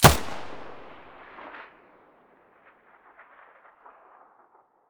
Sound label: muzzle; S.T.A.L.K.E.R.; IWP; vityaz; pp1901